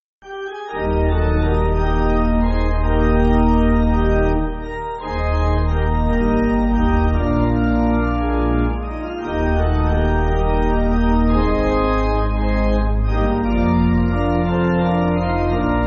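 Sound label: Pipe, Pipe Organ, Music Backing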